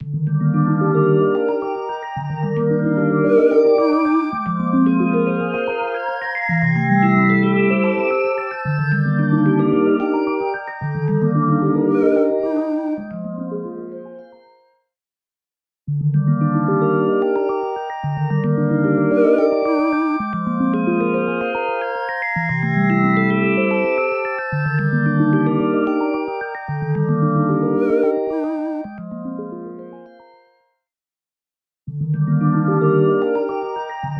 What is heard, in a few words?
Poly Synth Resonant Flange Expander Binaural; MicroPitch Immersive